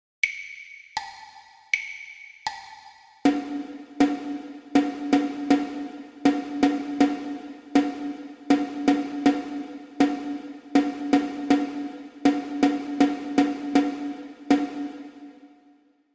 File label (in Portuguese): percepcao ditado ritmico